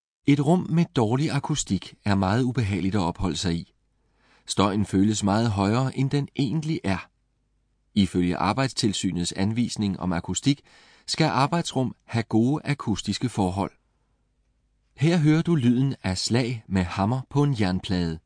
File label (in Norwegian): Romakustikk slag plate